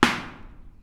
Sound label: splat metal thin; splat metal thin clip; splats